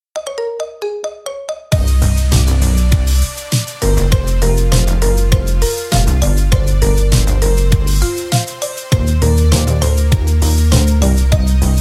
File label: marimba remix